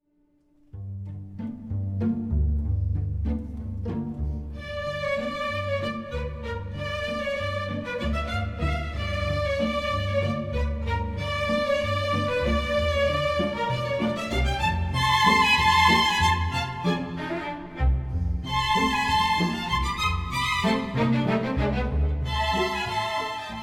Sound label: Serenata for Strings